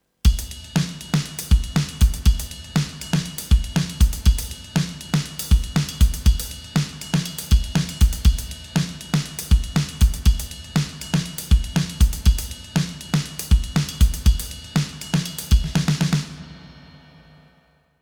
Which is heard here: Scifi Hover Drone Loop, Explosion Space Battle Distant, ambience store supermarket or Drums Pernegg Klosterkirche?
Drums Pernegg Klosterkirche